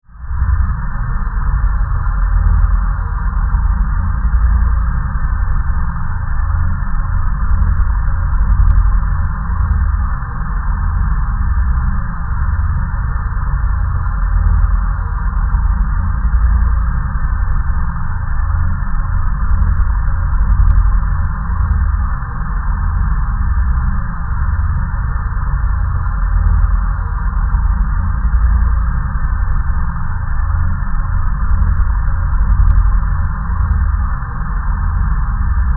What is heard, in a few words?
Drone, Alien, Power, Source, Scifi